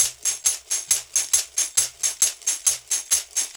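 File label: TAMB, tambourine; Drumdrops In Dub VOL-1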